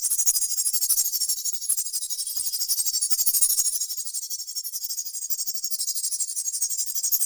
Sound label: Fairy Dust Loop; Warframe